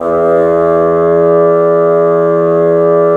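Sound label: wind bassoon; LA Composer vol. 3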